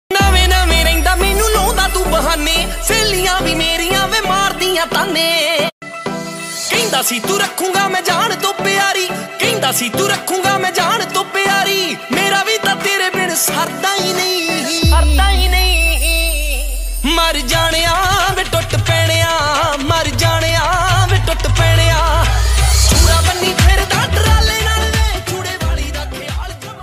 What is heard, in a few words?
Punjabi Ringtone